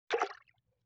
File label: Water Splash Small; Vintage Cartoon; Foley